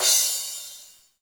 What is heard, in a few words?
CRASH, Hip Hop Misc Perc; E-MU Producer Series Vol. 8 – Platinum Phatt